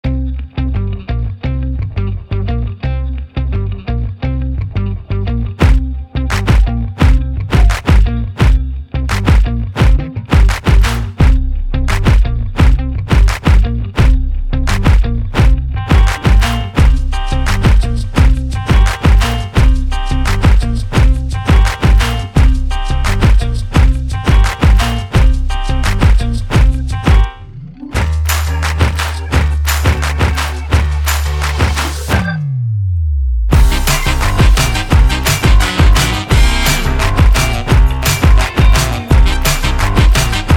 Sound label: Galactic Superstar Music